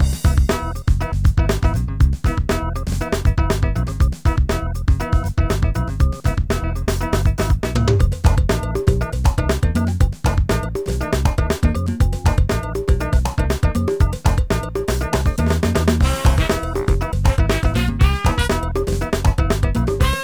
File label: Upbeat